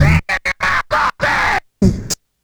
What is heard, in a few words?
Turntables